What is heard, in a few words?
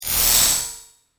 Space Scrape, sci fi sound effect; Science Fiction Sound Effect; From B Movies To Blockbusters; Andrew Fleming